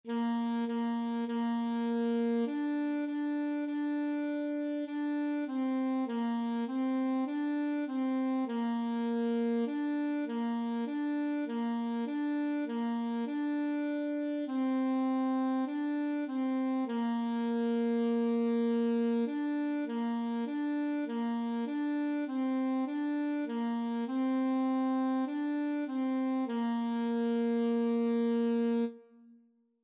recorder

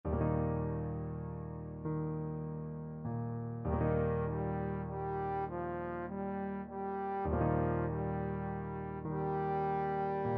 trombone

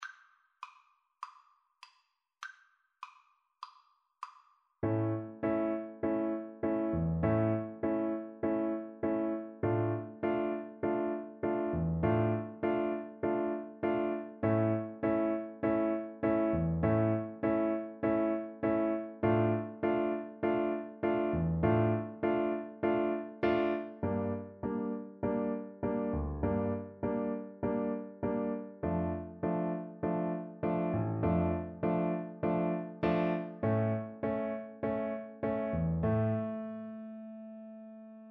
Piano Duet

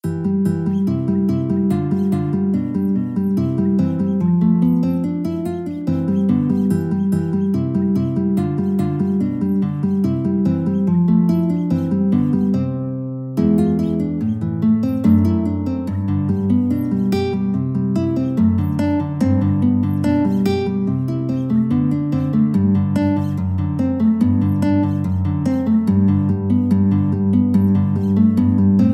Allegretto, guitar

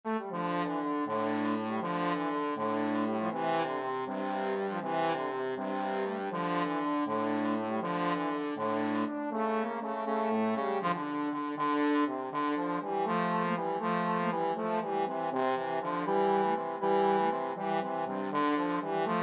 brian boru, trombone duet

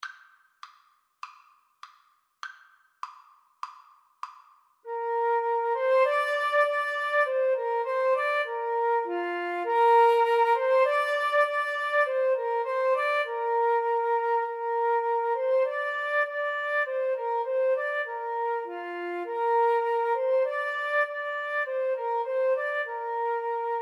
alouette, Flute Clarinet Duet

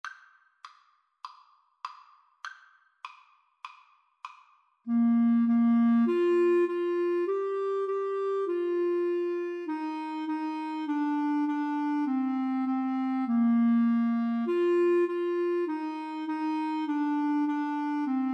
Clarinet Duet

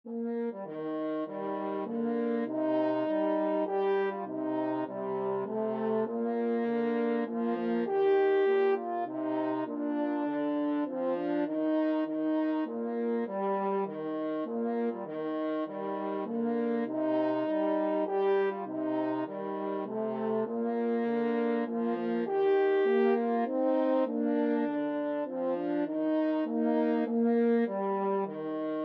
french horn duet

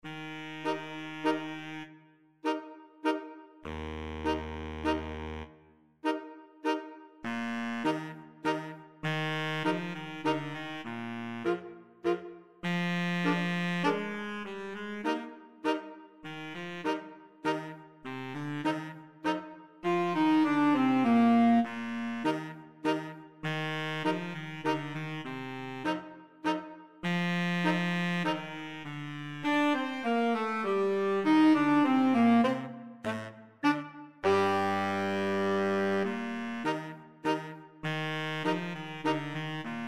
flexible mixed ensemble 4 players

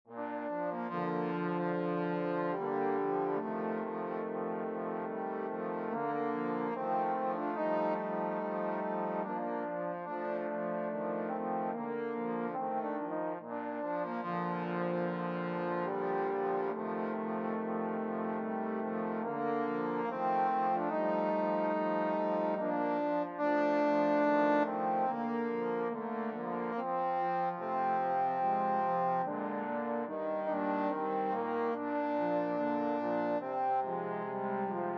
raindrop, trombone quartet

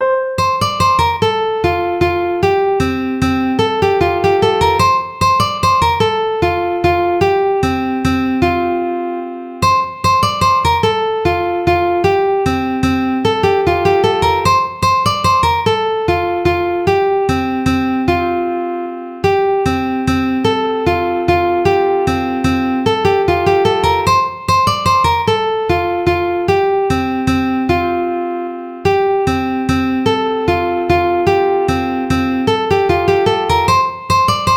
ukulele

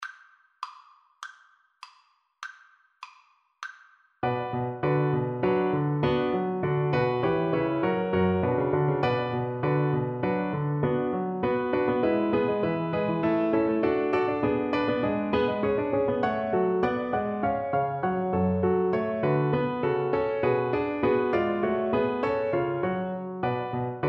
badinerie, Cello; bach

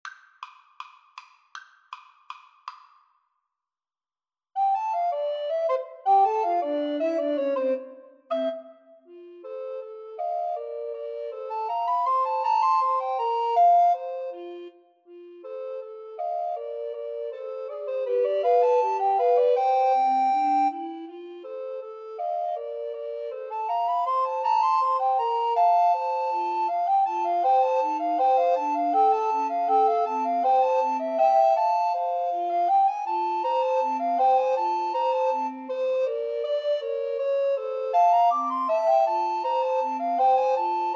the entertainer, Recorder Trio

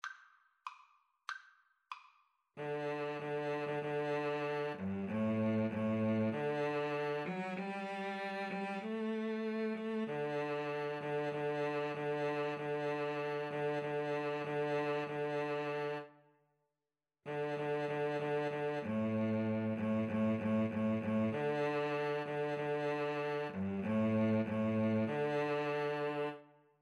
Cello Duet

